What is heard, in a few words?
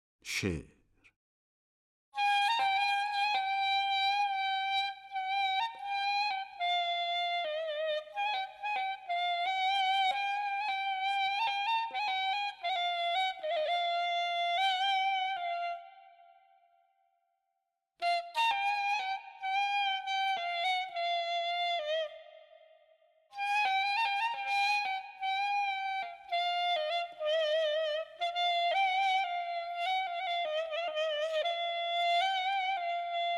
Jameh daran She-r Afshari Radif-e Ney; Avaz-e Afshari